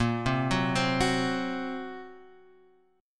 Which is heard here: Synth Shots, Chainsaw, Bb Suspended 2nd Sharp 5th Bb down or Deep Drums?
Bb Suspended 2nd Sharp 5th Bb down